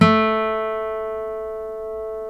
STEEL A, FOLK STEEL Guitars; Spectrasonics; Hans Zimmer Guitars VOL-1

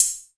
HHC VRB, Yamaha RY-10, Drums

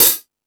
Ambient Rock, drum machine

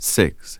VOX GENERAL; Megabass Remix! 2

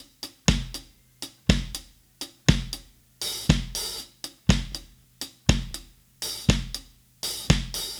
Drums, 70s Analog Funk

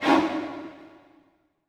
HIT, Orchestral; Orchestral Loops; 128 PSYCHO

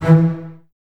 bass E 3, String Ensembles, Basses, Stacc; Miroslav Vitous; 90 s Sample CDs

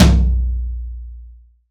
F-TOM 20, PIN STP TOM

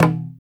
RITUAL DRUM; ETH. PERC

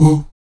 VOX AHOO, Afro Chants, Stacc Ah Oos

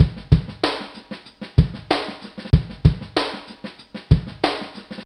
Swingn, Drum Loop, Yamaha DTXpress

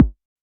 Multi-Kick Short

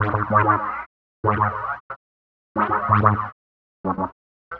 Wah Synth; Piano Stomp

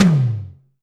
tom, Alesis DM5

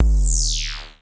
Klone Dual-Percussion-Synthesiser; EFX

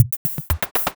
Loop, Drumsynth Drums

